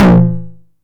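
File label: 909 high tom tape saturation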